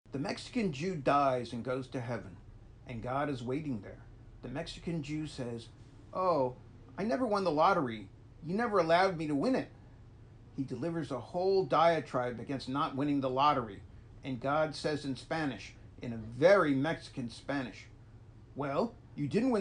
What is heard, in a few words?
Laugh Track; Ilan Stavans; Joke